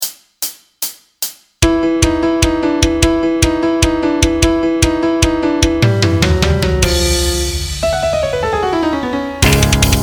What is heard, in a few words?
Explosive Metal Technique Brutal Etude! Backing Track